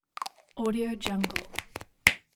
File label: aerosol can close